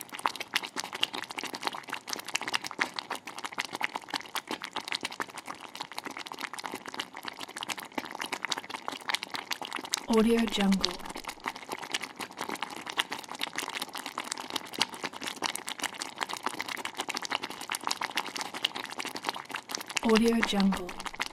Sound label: household cooking tomato sauce bubbling in pot